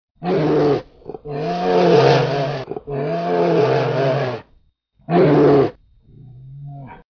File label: Bear